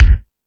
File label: Lotsa Kicks, Drum Machines